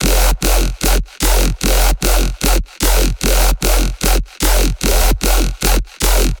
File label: Bass Loop Echo F 150BPM; Dubstep; Ghosthack; Advent Calendar 2022